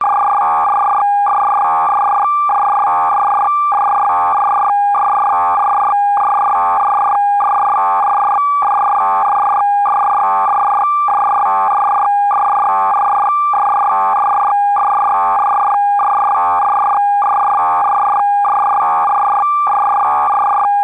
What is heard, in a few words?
science fiction data telemetry beeps rythmic loop; Skyclad Sound